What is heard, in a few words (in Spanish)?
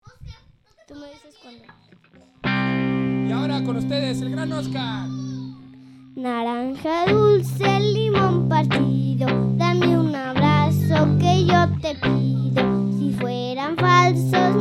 naranja dulce